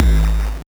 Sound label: Noise, 8-bit, Bitty Noises